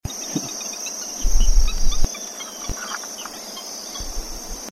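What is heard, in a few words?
Porphyrio martinicus, bird; گالینول بنفش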